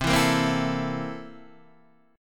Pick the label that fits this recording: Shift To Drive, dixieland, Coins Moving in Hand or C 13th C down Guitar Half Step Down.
C 13th C down Guitar Half Step Down